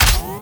Burst Gun Fully Charged; Warframe; Archwing

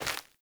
Grunt Step Default, footsteps; Enemy; Characters; Corrupted Sproutfolk

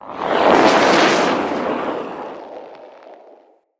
Arrow Barrage Whoosh; Level Events; Midrath; Warsong Prologue

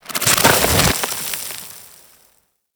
Micro Burrow Enter; Warframe; Corpus; Arachnoid Micro; Abilities

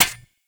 Bird Three Single Step; Ambience; Warframe; Entrati

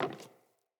Sandals Walk Wood; Player; Footsteps

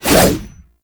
Bayonet Melee Combo; Warframe; Tenno

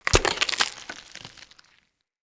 Pumpkin Destroy

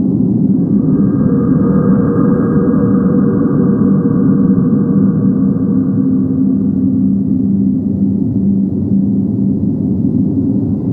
Infested Interior Tunnel Amb; Warframe; Corpus

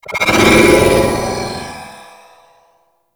Reckoning Attach; Warframe; Paladin

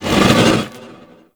Rock Elemental Attack Vocal; Warframe; Warframes; Brawler

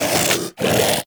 Vocal Breathe; Warframe; Hunting Critters; Vulpaphyla

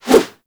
melee idle whoosh; Warframe; weapons

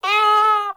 Kitten Meow Agitated; Enemies; Warframe; Catbrow

